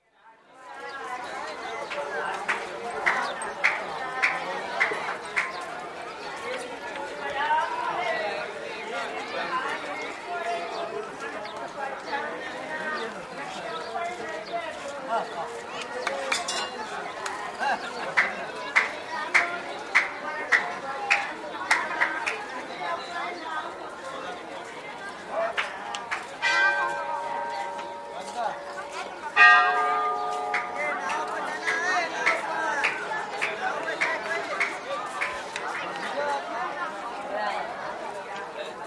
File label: Benares: Crowd near temple, with chanting, handclaps, bells & speech; Crowd Sound Effects; BBC